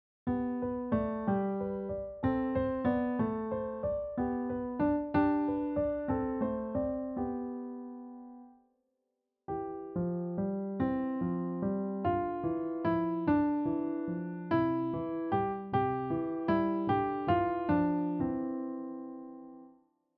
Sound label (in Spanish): Ternario